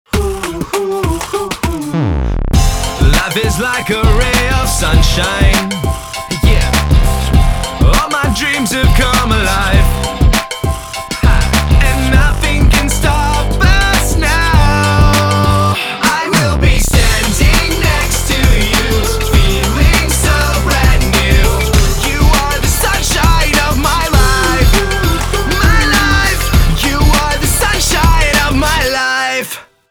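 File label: jingle; Adilles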